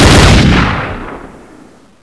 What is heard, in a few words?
barrel, weapons